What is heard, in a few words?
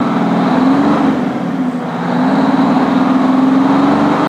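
Truck Diesel Single Rev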